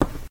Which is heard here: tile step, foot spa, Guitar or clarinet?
tile step